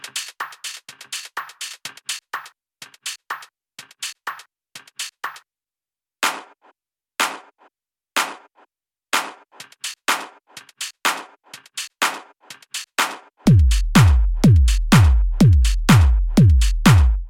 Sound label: beatprogramming